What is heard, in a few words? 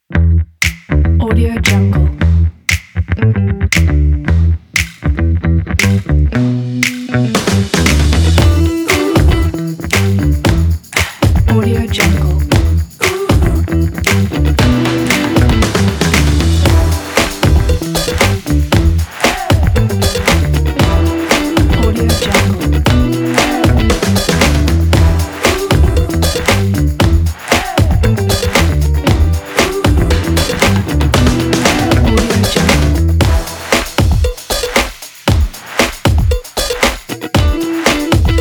funk kit